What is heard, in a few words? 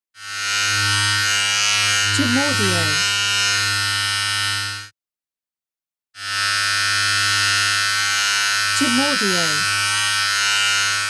Hair Trimmer